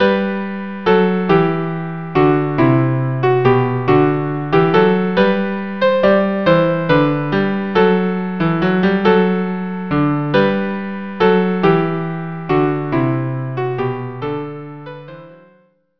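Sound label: galliarde viola cello; frog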